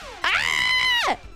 AAAAAAAAAAAAAAAAAAAAAAAAAA, Scream; IRyScream

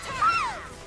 hope noises

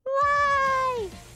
waaaaai

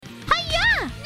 hyah

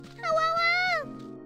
howawa

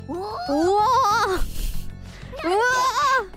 uoh

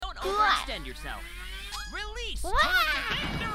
wah

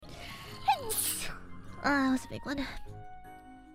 Sneeze

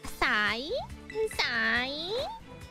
Psyduck impression

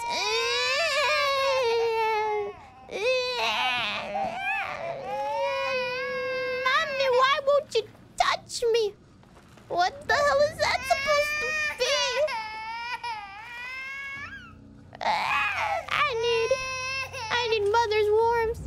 baby; noises

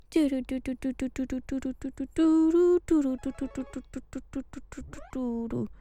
Singing; MegamanX main theme; IRySinging

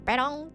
noise; Yoshi

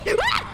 Scream; IRyScream

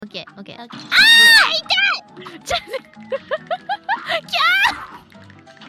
scream; IRySora

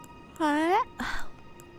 Yawn

